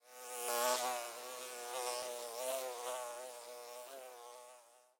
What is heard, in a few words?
amb fly; wingsofriches